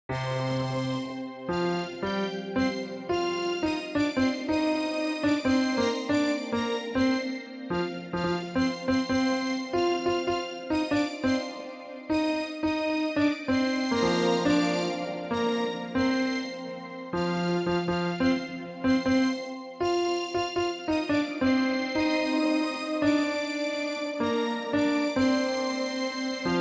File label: Instruments